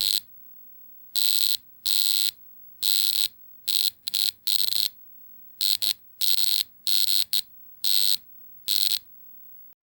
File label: briefcase latch; telegram